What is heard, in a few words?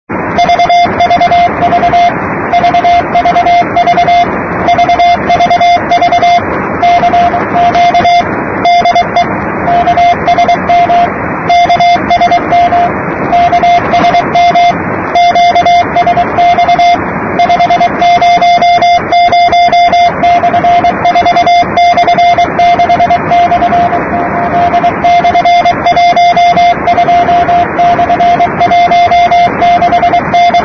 22445 8 khz